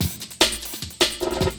LOOP, 150 BPM, Drum & Bass

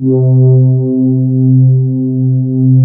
ARP, Analog Fantasies; USB Soundscan vol.49; AKAI

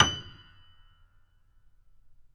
Grand Piano Mf F#6; AKAI S6000 CD-ROM - Volume 2; Grand Piano 256M

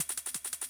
loop, 170 BPM, drum & bass

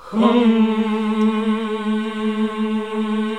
HMM A1, CLASSIC VOX8

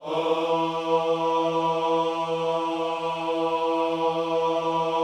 OHS E 3C, Gospel Ohs; Spectrasonics; Vocal Planet

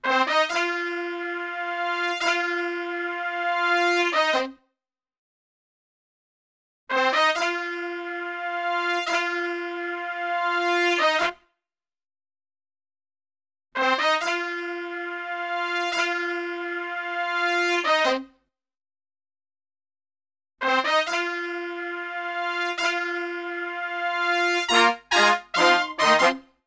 ROCK F M, Brass; Best Service; Brass Super Section; ROCK LICK

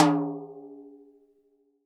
TD-20 Tom B, Roland TD-20 Toms

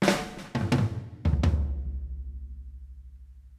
Fills 81; Zero-G; Monster Beats

